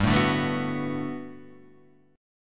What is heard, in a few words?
D 6th G, chord; D6/G strummed; JGuitar